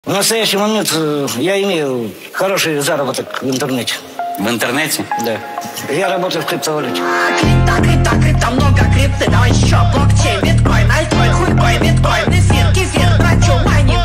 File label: крипто фонк crypto phonk